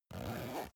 zipper use slow short

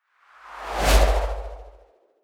whoosh impact soft wobble bass high

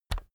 keyboard external key push

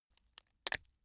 crack knuckles

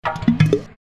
liquid event

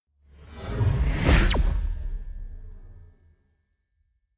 whoosh shimmering zap

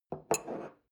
coffee cup place

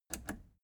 plastic button scratch click enable fast

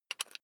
electric drill bit fasten wrench apply

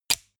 click

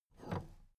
big plastic case handle take

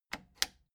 plastic button latch loose click disable slow

